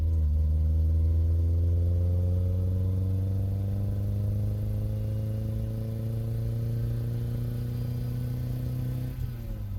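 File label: engine low loop; Blind Drive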